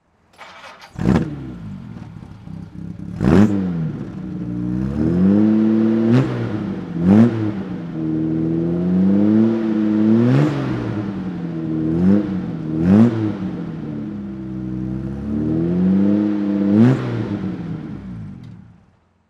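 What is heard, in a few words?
jaguar xk120dhc throttle, Engine sound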